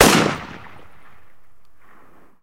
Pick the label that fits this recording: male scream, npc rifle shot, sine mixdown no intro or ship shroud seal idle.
npc rifle shot